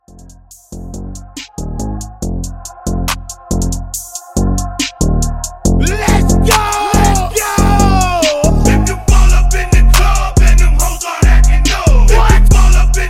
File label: Classic Hype 70 CLEAN